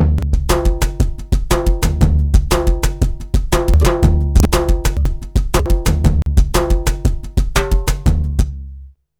Modern Percussion Loops, MPC60 119; Soundscan vol.56; AKAI

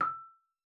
marimba E6; Xsample VOL-4

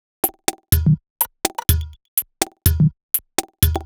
Top 124bpm, House Drumloops; 90 s Sample CDs; Zero-G House Fabrik Drumloops; ZG Drumloops

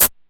Shaker, DIGITAL KIT; Roland MV8800

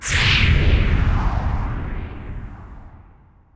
Blast, Explosions

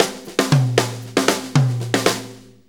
6-8 Fill, Drum Tools

